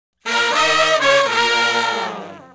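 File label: Shuffle Blues Riff (Bb) unison falloff w growl, Horncraft for R&B Sections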